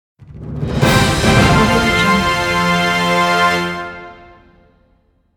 fanfare

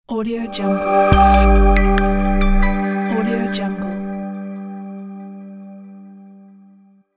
cool logo

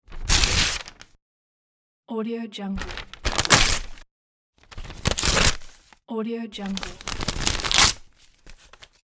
turn paper

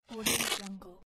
organic squish

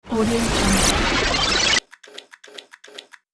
science fiction recharger

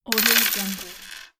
coins

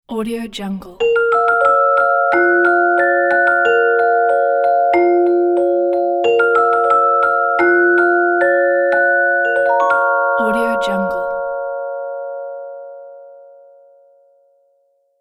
magic celesta ident

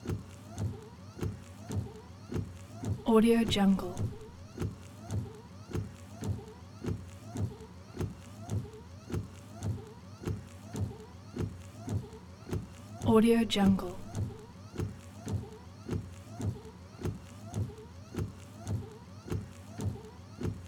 car windshield wipers

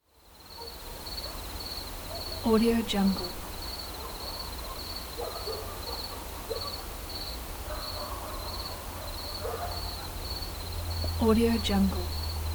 village ambience with car

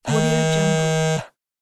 vibrating phone

